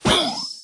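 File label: wallbreaker attack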